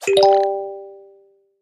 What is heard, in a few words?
Notification Pop Up